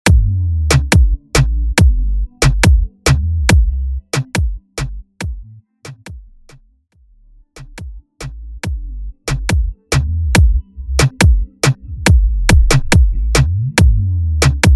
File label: Intro Outro Dirty 70 BPM Urban Pop